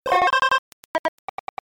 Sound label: User Interface Beep Error 404 Glitch